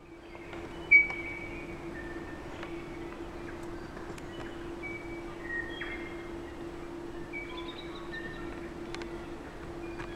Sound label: birds; nz